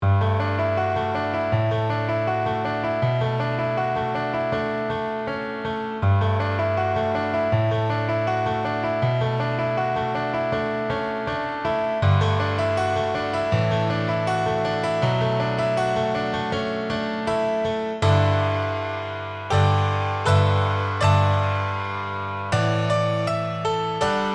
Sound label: mini grand dance